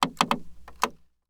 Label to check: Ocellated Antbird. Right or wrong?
Wrong